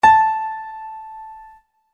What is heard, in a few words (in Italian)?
piano, armonici